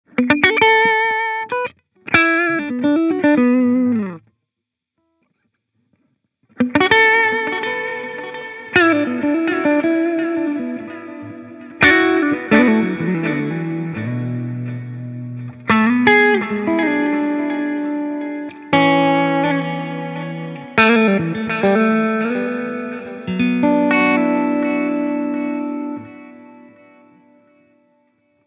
Delay Reverb Hall

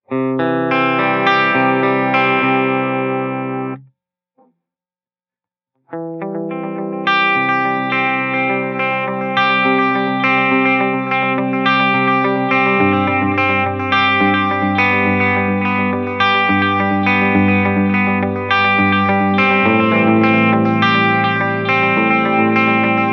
Digital Pristine, echosystem

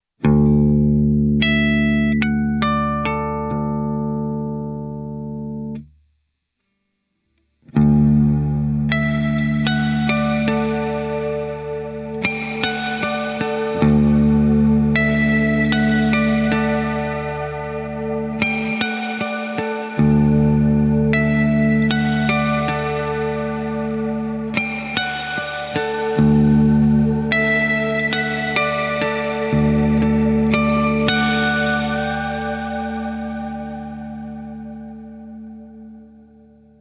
Ghost Harmonics

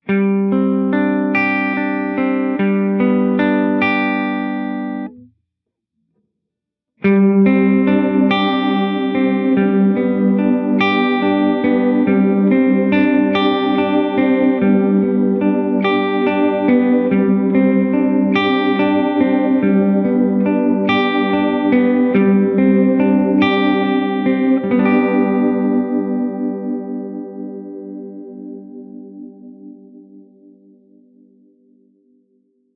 Glitch Lo Pass, reverb

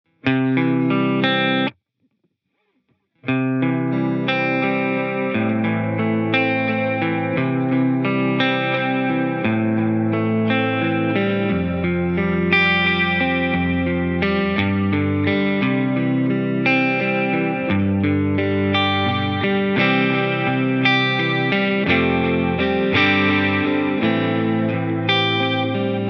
Tape New Tape, echosystem

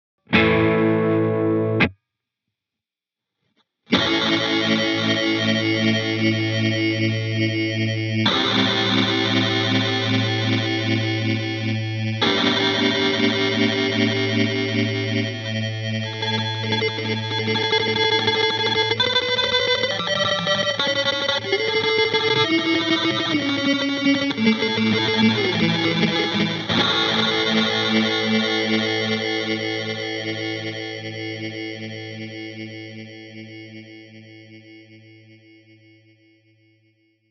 Lofi Digital; echosystem

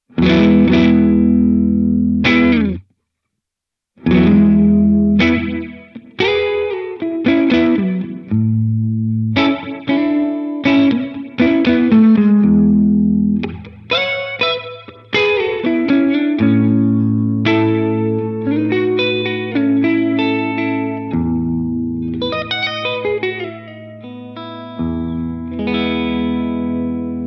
Modulation Flanged Reverb Modulation Flanged

